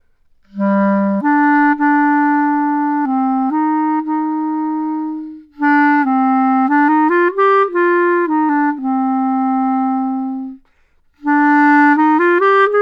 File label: CLARINET; BOMBLET